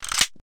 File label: reload; EMXArms; emx pmg90